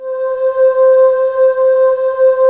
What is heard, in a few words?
SPACE VOX, JV PADS; Pro-Rec - Synthsonic